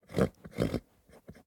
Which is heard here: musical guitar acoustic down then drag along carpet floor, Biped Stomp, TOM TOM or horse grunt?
horse grunt